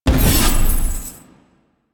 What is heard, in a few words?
Shared Fate Chain Stop; Warframe; Warframes; Pax Duviricus